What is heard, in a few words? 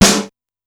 SDS2000 SN; SDS2000 Factory7